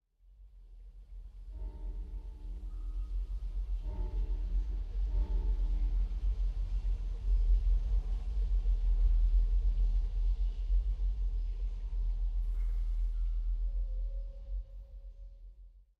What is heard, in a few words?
Pump Ambi; Pitch Black Audio Pong